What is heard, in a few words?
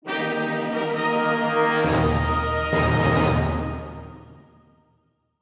US Victory Short; battlestations pacific